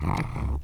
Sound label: banshee idle; npc